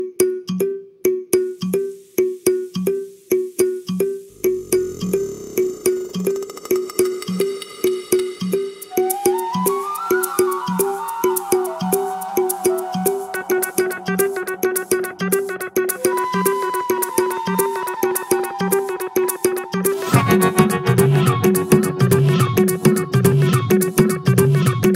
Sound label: Shiv Sadhu Jogi Music, Bhakti